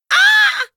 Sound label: pain; awesomenauts